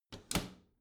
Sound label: bathroom cabinet close regular